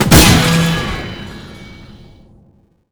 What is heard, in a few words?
fire break